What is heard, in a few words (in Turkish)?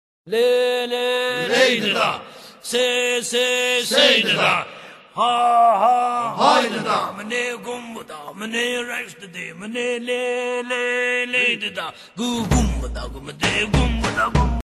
Telefon Zil Sesleri Komik Telefon Zil Sesi